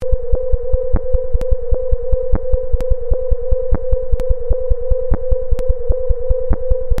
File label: Kepler Star Light Curve Waves to Sound